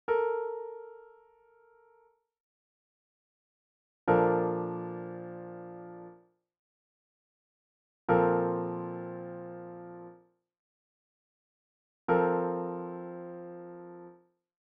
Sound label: Chord